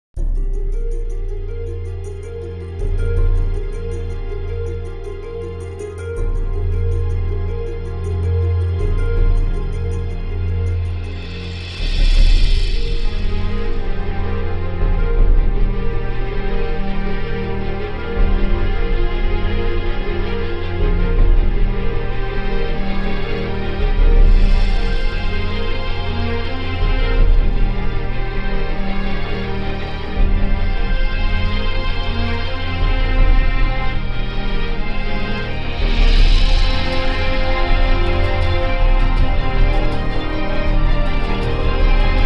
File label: BGM Sad